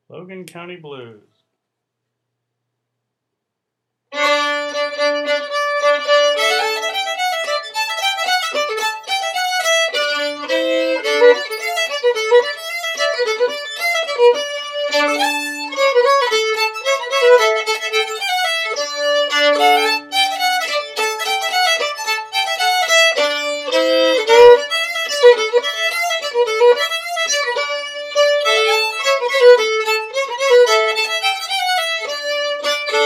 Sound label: fiddle; festivaltunes